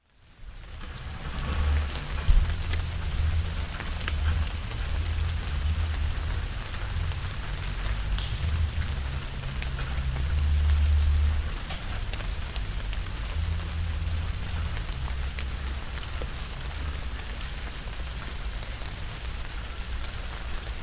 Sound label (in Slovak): park dazd; surianky